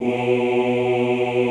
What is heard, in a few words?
OOH B1, Classical Choir; Best Service; Extended Classical Choir